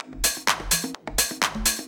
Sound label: Dance Grooves; Best Service; Dance Mega Add On Grooves